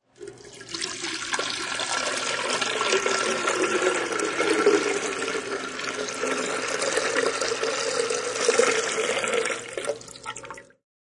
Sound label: Pouring liquid into container; Pouring Sound Effects; Objects